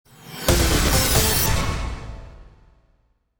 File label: Breaking Traffic logo; WLS